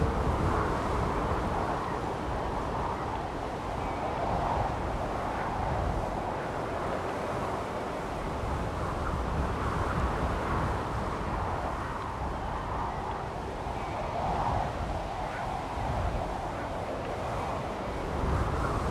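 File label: snowstorm loop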